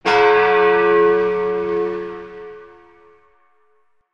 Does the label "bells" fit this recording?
Yes